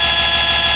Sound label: snake move